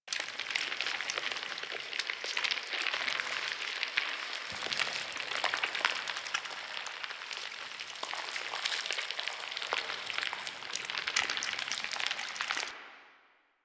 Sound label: Infestation Loop; Warframe; Sacrifice quest